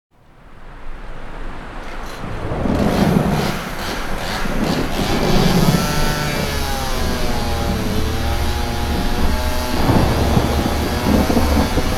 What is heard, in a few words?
chainsaws; Halloween Nightmare CD Sound Effects